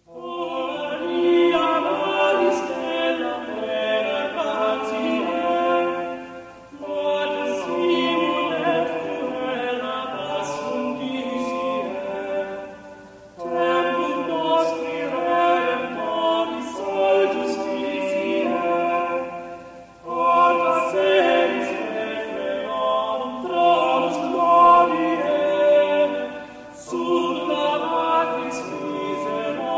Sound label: The Early Motet